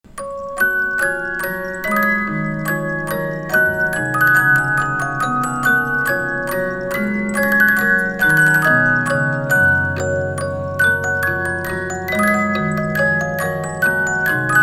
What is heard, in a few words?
magic flute note, music box; 72 note